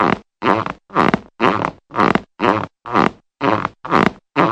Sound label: low creaky footsteps